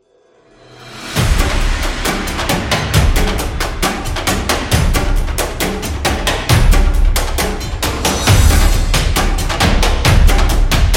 Tribal Drumming; epic drums

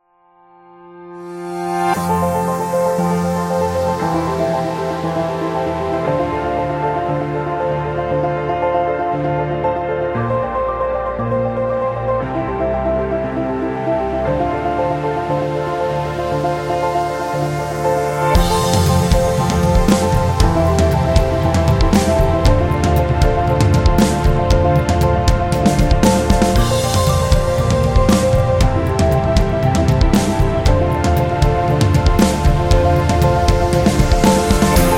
Wedding Music

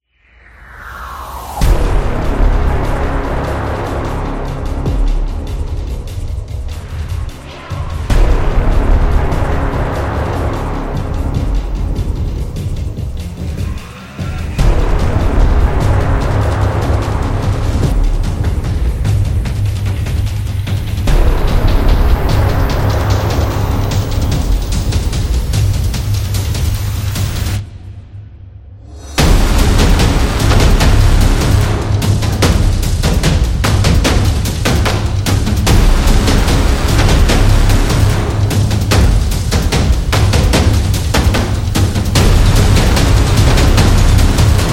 Epic Intro; monster attack

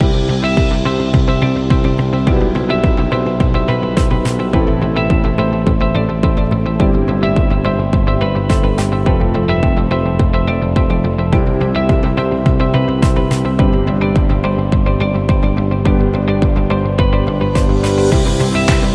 Commercial Loop

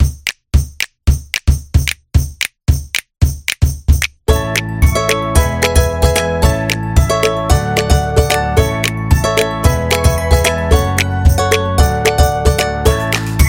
Happy Folk Upbeat Music; happy music vol 10

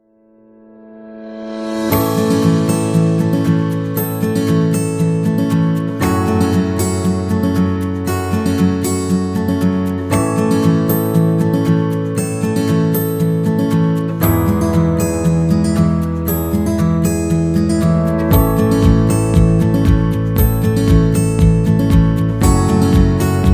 Guitar Inspiration, happy music

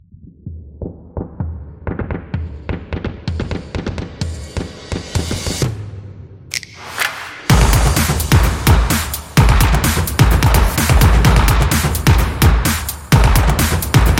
This Is The Drums, percussive; percussive nation vol 2

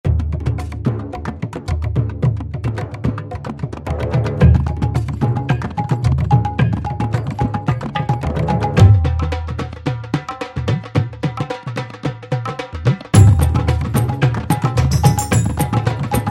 Indian Drums

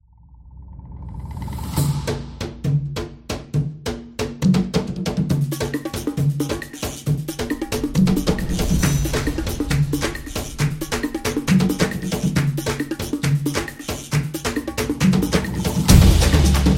samba drums percussion; tribal dance

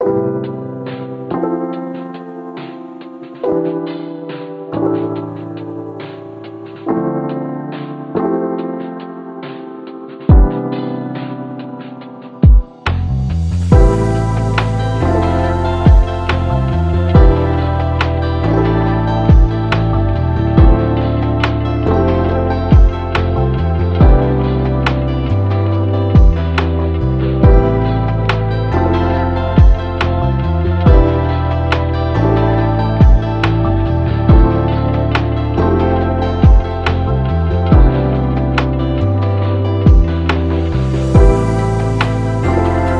Lofi

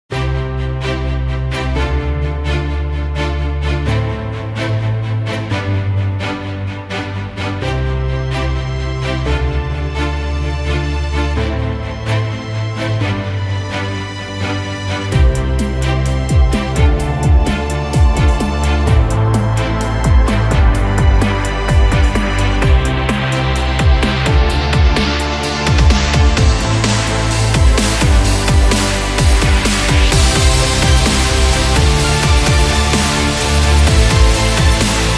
Motivational Energy, epic sport metal

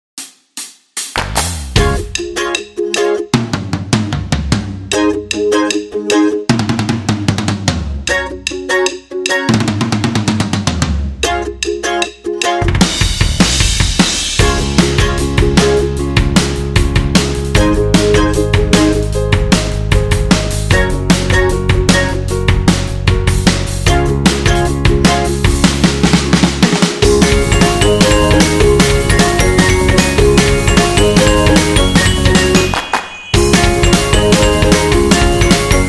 Ukulele versus Drums, happy music

